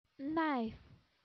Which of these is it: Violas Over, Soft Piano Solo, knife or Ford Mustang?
knife